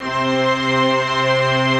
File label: F3 STRINGS; Greytsounds